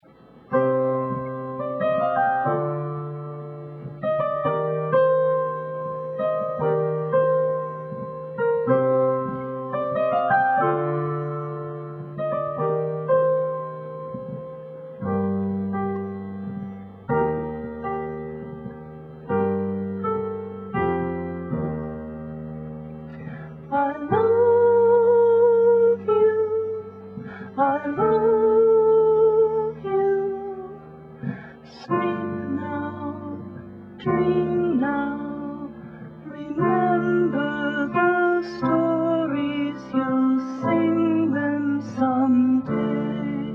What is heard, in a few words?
music test; russian vine